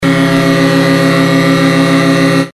Train horn SMS tones

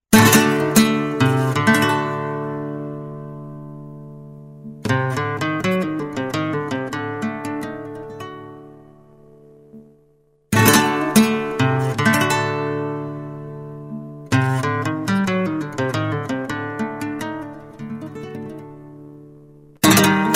Guitar Classic

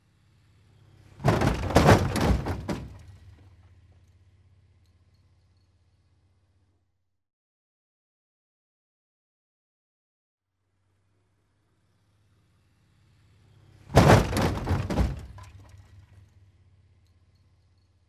AUTO SWIPE صدای برخورد خودرو

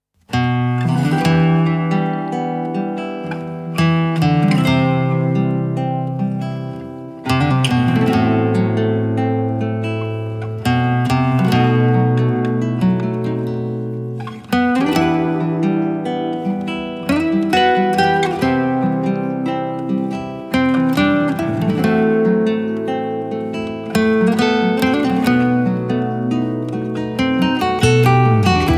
gitar

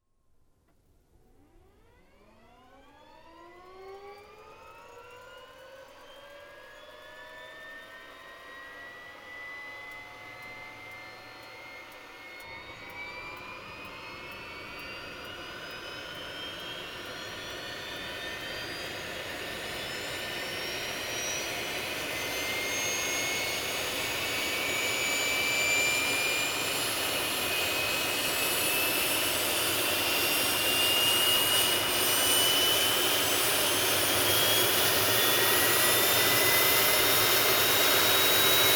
AIRPLANE JET صدای هواپیما

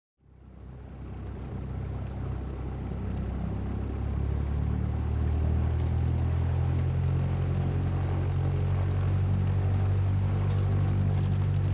AIRPLANE SINGLE PROP صدای هواپیما

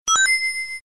SMS Tones eletronic light short

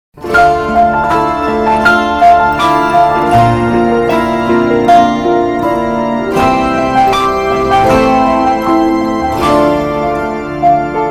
keyboard piano, Ringtone

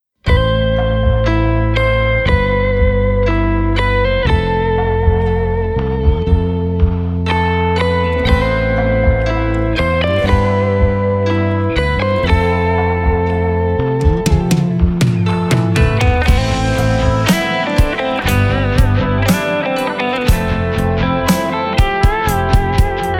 Ring

